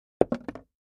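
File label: Mech Wood Object Move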